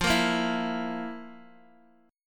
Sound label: F Major 7th Suspended 4th, Guitar, DADGAD